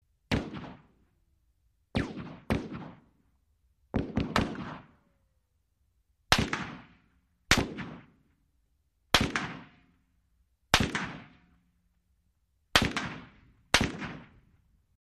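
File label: AK47 Single Shots Impact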